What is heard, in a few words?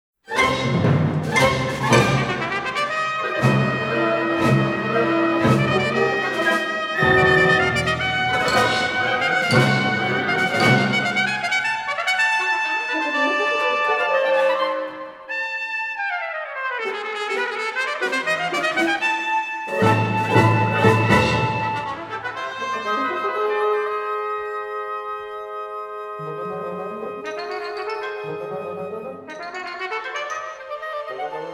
Bass Suite